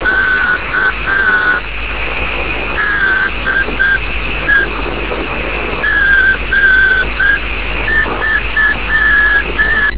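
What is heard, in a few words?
47 GHz continuous wave; g3pyb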